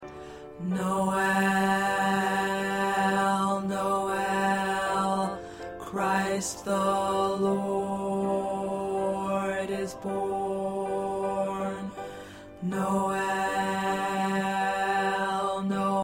Baritone

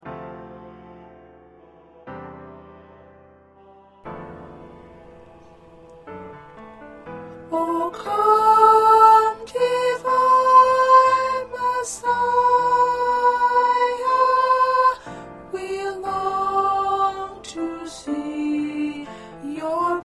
Soprano, choir parts

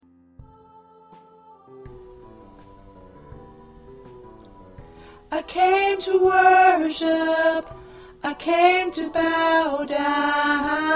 Tenor, choir parts

